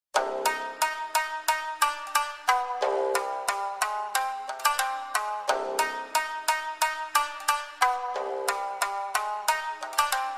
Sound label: instrumental